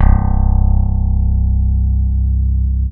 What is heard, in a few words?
Single Acoustic Basses